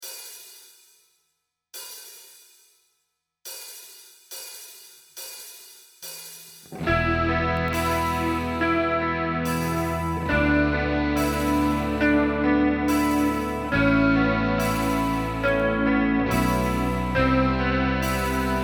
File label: Arpeggio Song